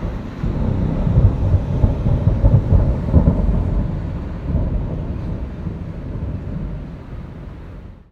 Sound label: Thunder, Infinity Room; Thunder, Rome 8"